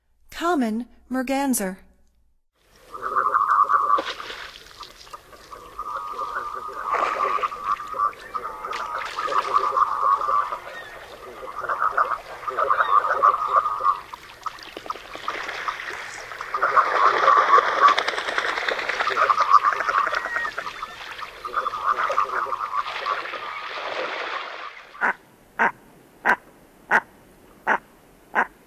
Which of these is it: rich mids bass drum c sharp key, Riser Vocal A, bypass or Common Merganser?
Common Merganser